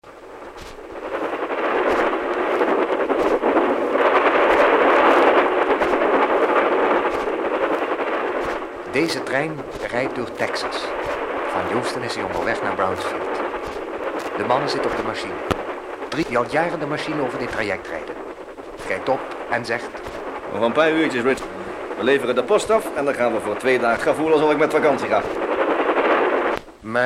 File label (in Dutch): overval